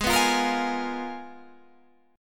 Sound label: G sharp Minor 6th Add 9th